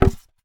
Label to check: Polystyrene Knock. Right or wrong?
Right